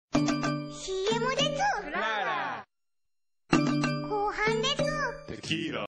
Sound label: Eyecatch; Kuragehime